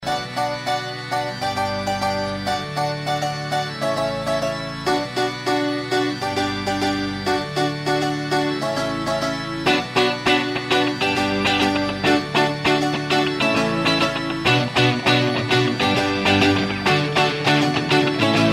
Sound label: Cool Tone